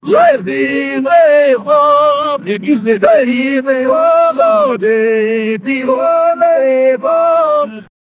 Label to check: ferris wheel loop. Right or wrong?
Wrong